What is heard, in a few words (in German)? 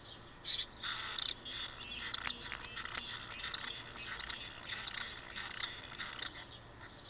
Lockruf Mauersegler Apus apus